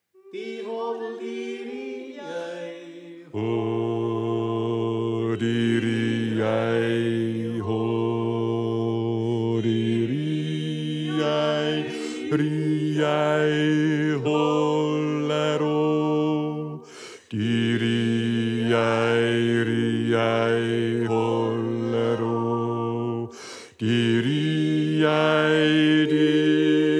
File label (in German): Bass